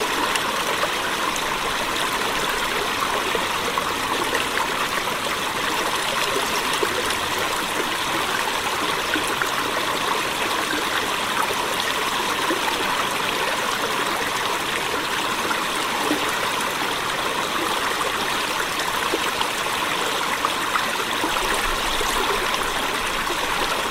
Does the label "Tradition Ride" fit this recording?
No